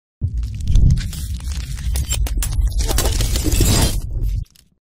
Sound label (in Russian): vremia idet nazad